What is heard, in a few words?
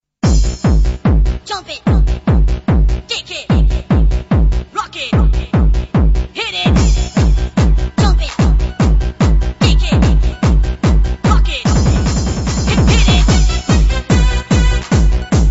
Good Trance Music